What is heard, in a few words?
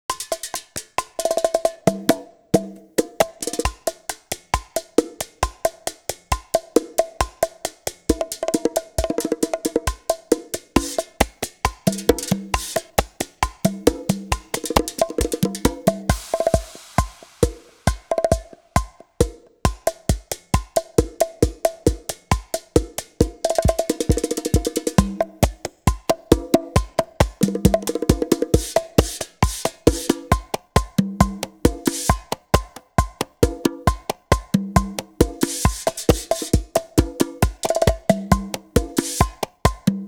Bachata 2.0 Sound Only Perc Congas